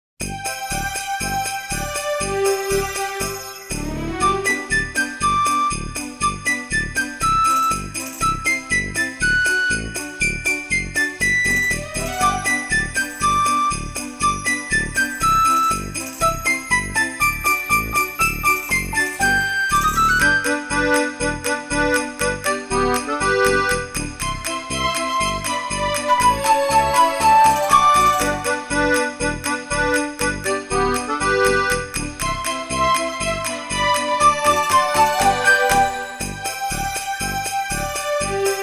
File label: Jingle Bell; Linda Cube